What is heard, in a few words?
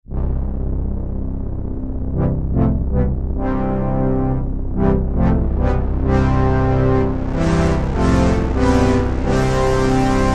poly big